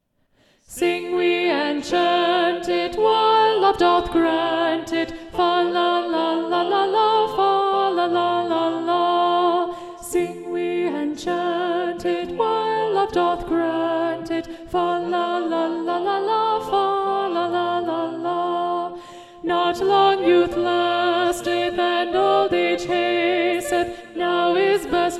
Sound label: SATB Alto Predominant; Sing We and Chant It; Thomas Morley arr Russell Robinson